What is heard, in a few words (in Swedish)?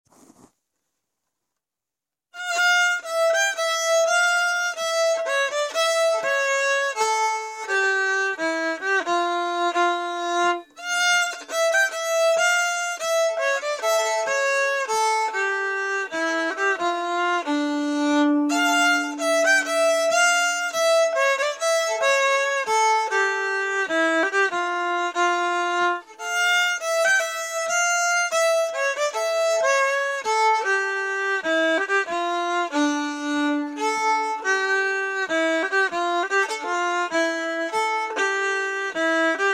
Polska, 2a-stamma; Lill-Ola man, Polska fran Sarna